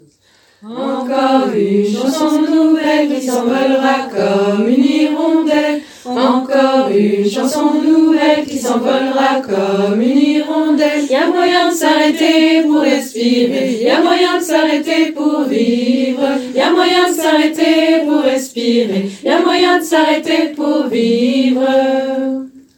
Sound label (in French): lead